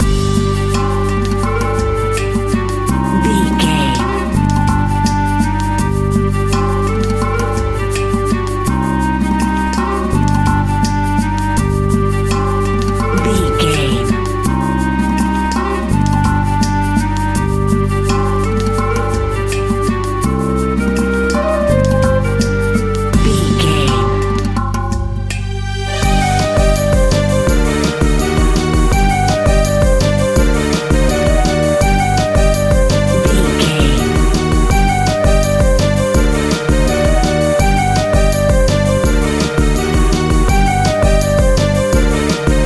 indian war music, An exotic and colorful piece of World music. Groovy, powerful, exotic and wild. Non western music